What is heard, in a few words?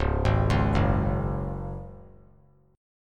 C sharp Suspended 4th; arpeggiated; 3C,3F,3A,3D